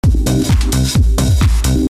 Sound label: bp filter source